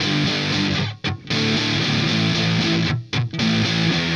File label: Guitar, Indie Rock; Sonic Foundry (Sony Creative Software); Indie Rock Anthems; Session 06 - Haze